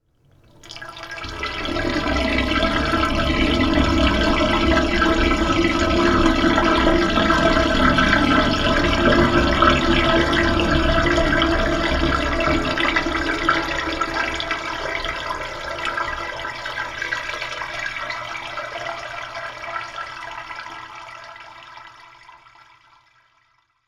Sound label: Drainpipe; DR100; RRich